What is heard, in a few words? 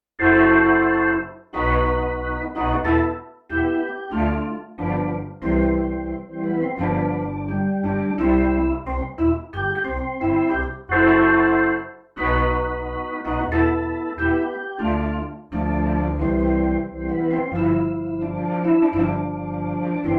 Wedding March Organ